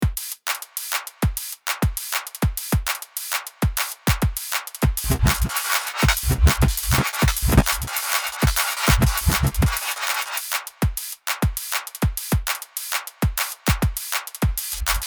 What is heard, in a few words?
drumloop strum; hologram electronics microcosm; midisync